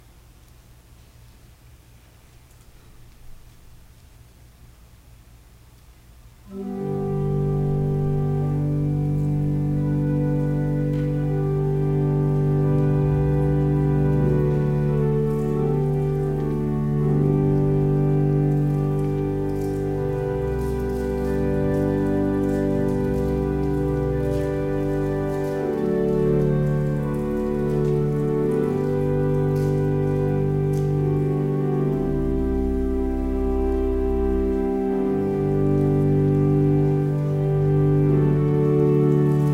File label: Organ Music; The Law of the Lord God